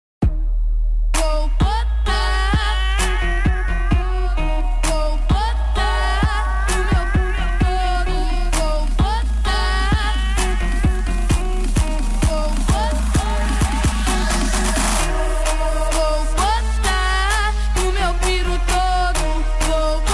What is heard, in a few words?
trap funk